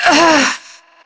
Struggle Long; New War

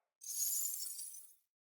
Wyld Bow Draw; Fey; Saboteur

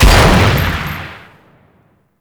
Shotgun Shot; Warframe; Weapons; Veilbreaker; Kahl

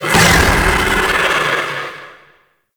Dragon Pelt Breath Activate

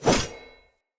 Short Sword Whoosh; Weapons; Enemy; Swords; Short Sword Elite

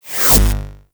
UI Exit

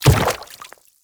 Quadruped Medium Land Water, Footsteps; Warframe; Infested

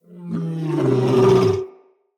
Battle Dog Yawn, Animals; Vocals; Snc Battle Dog

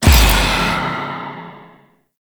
Specter Appear; Warframe; Stalker

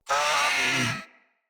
Vocal Death; Warframes; Khora

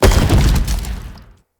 Blunt Heavy Impact Wood, Weapon Impacts; Player; Environment

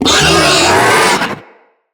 Infested Juggernaut Vocal Attack; Vocals

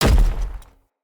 Step Default, Footsteps; Enemy; Nimrod

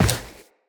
Run Default, Footsteps; Characters; Tenno

